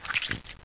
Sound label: squish